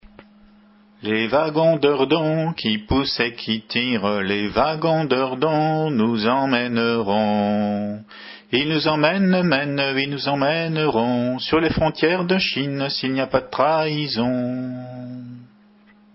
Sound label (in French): Chant Gallo, Chant à Répondre